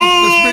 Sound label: ooh, Voice